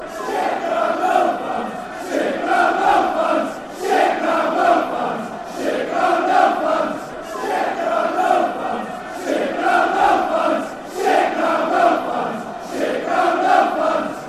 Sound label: sit down, fanchants; scfc